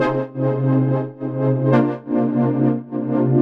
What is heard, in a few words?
Pad 140 C, sidechained samples 140bpm